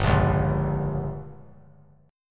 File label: G Diminished; strummed; JGuitar